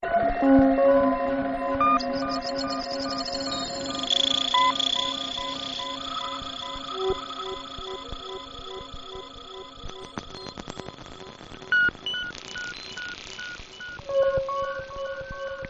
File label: rainforest